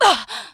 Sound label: injure female; character